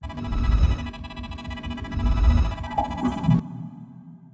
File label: scanner; Stellaris